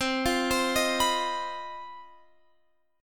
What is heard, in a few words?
C Minor Major 7th Double Flat 5th chord, Guitar Open F; CmM7bb5; arpeggiated